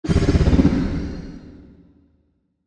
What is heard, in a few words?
attack; Barotrauma; Legacyendworm